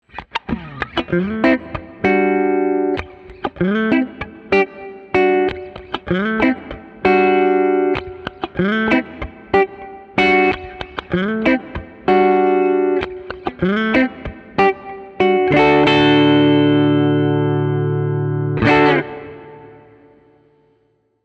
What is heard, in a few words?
Neck Bridge in Series Clean